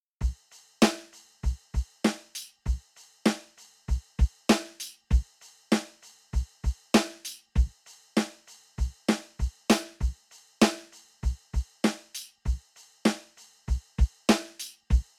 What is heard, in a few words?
drums